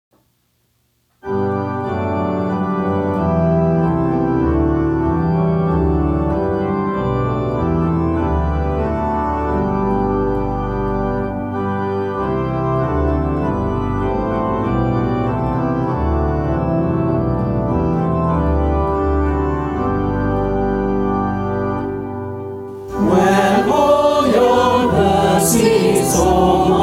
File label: SATB